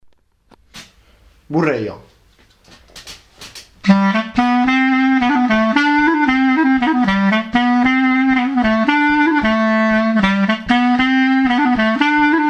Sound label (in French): Bourree; rassemblement-ateliers